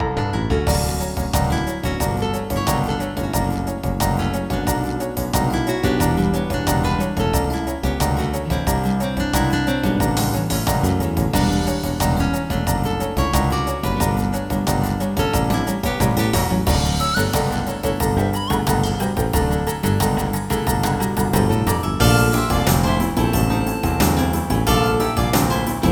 jazz; MIDI Music File

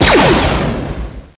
rifl blst; CD Action; yoda

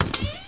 FRIDG; davidson

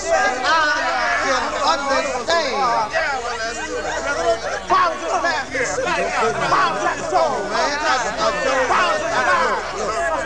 room

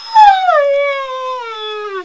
DOG SCARED

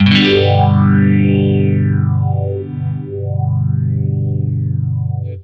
Clean Phase G maj, guitar, clean phaser, chords; Guitar Special

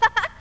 Hehe

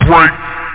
fbreak

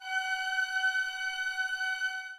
string

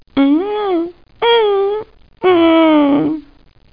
whines; T.J. Finds a Friend; Boomer

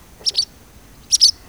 crit stop; peach

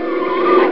logo skid; Amiga Games Extra 1996 #6; harrysballoons; sonix

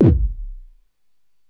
KICK, Ambient, Drum

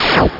Lazer

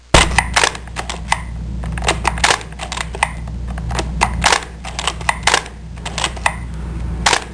Camera Rewind and Click

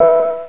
Get Weapon; HAVOC; Device Q

